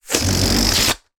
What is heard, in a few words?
foley balloon deflating; sound effects one